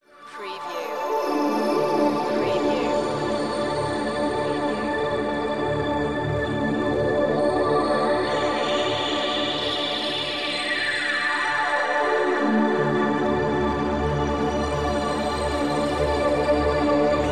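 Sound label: Production Element Bed Synth; Sound Effects Bible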